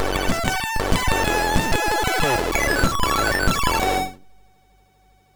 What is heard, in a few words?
sid MOS6581R3